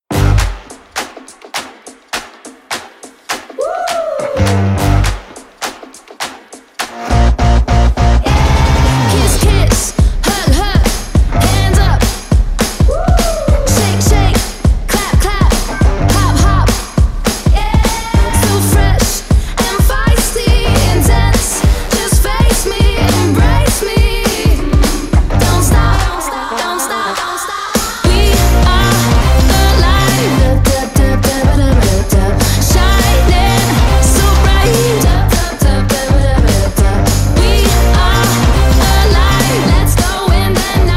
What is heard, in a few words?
jazz